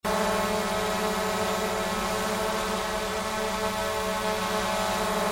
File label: drone